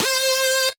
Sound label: Synth, DnB One Shots